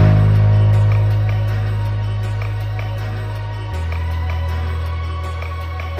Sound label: keys, Phonky loops